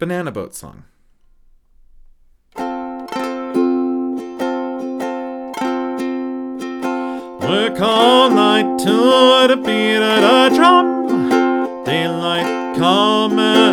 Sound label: Banana Boat Song, ukulele